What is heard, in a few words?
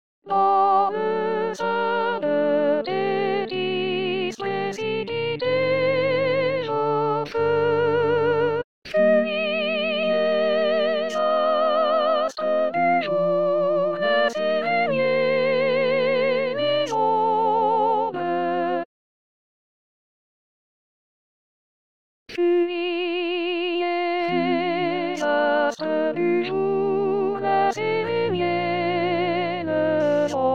soprano; sein thetis